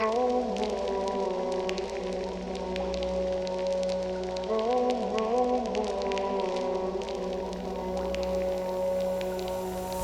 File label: beatz voces